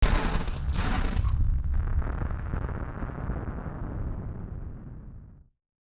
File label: Firework FX; musicradar; bomb factory